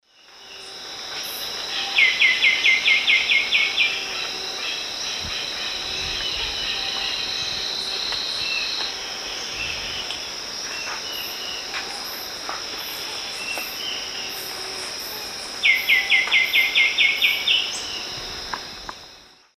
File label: Pachyramphus polychopterus, song; S. America; Eastern Lowlands